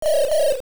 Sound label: Owl Hoot; The Owl hooting (single and double hoots)